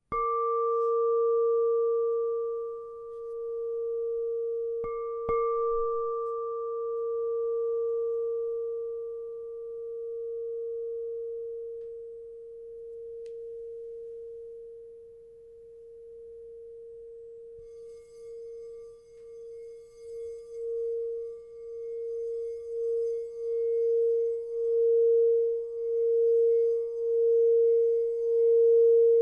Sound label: Crystal Tones Singing Bowls; Crystal Tones Alchemy Singing Bowls